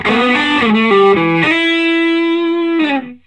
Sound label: SOLO E, Vintage Blues Guitar